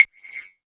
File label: CLAV; Roland MV8800; Dirt&GrimeKit